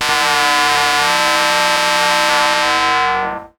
Classic FX; radiosonics; Classic Simple OSC FX

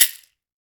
Latin Shakers, mexican

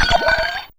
Spoon, Fairlight CMI IIe Effects

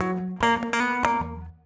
GIT, Drum & Bass, 145 BPM; Ueberschall; Drum & Bass Resonance

